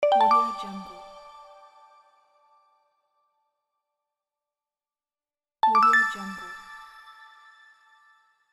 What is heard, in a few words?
notification sound